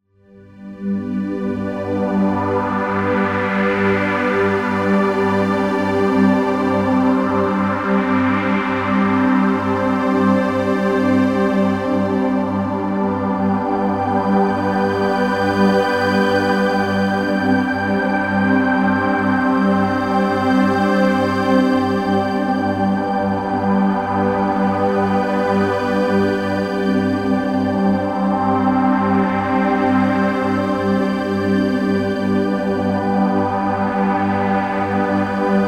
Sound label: soft moving pad